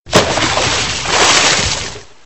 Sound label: snowball splash; christmas craft